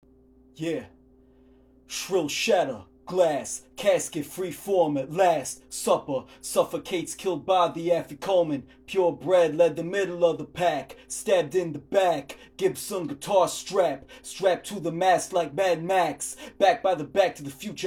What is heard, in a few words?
Vocal Recording Sample Phone